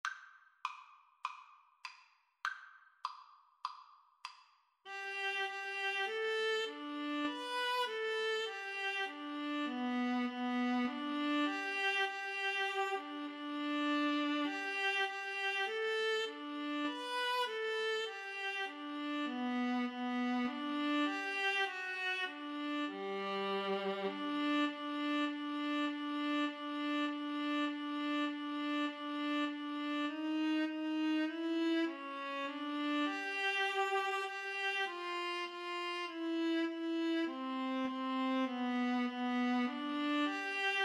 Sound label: ode to joy viola duet